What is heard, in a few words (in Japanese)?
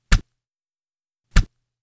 ashioto pecya; 足音11 （ぺちゃ）; ループ用 若干ウエッティーな足音